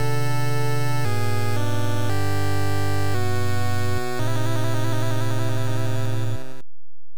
Defeat; Heracles no Eikou II Titan no Metsubou; family computer

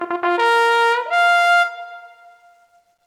Ewok Horn; lego star wars the skywalker saga; ps4; xbox series xs

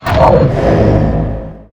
Skill Buff Heavy; Monster Squad

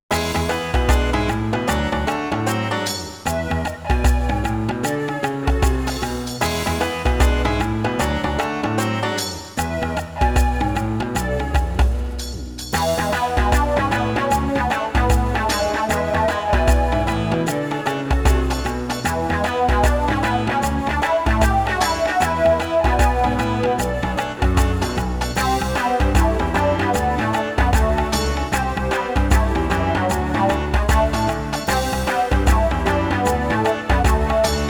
Rock Beat; I Love Work

